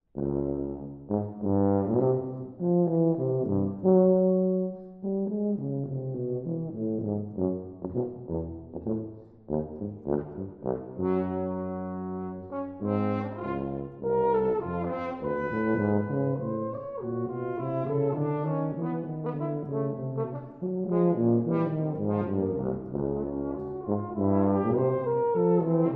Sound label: Suite for Horn, Tuba and Piano, Maestoso